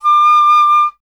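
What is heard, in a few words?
flute piccolo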